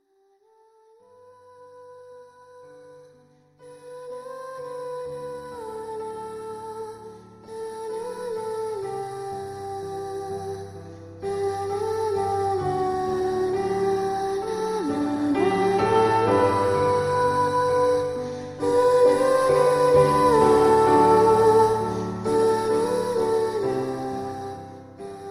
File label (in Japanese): rarara